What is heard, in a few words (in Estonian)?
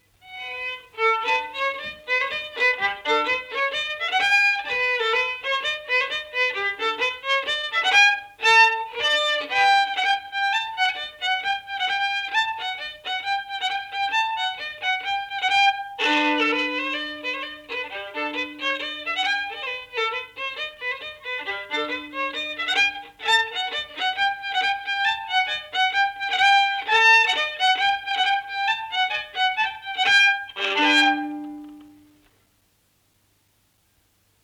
Springvals Jooksuvalss viiul folk; Ruhnu; Elias Schönberg